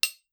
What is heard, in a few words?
metal small; Phasmophobia